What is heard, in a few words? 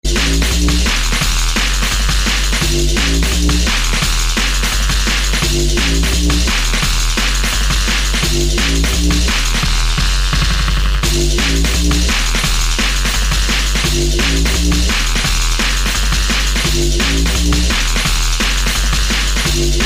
Drum And Bass